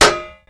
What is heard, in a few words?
metal; Mafie 1